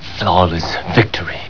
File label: Victory